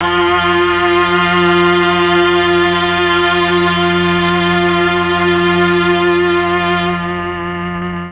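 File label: F2 MIXED STRINGS, Mellotron; Mike Pinder - Mellotron Archive CD; MIXED STRGS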